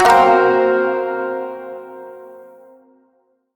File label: Shawzin Blues Chord J; Chords; Warframe